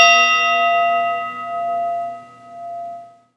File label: bowl